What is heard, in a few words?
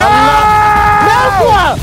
death; nogla